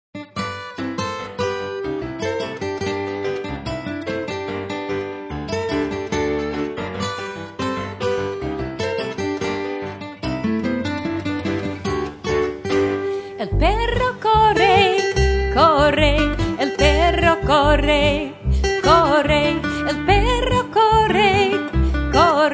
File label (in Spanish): el perro corre; animals verbs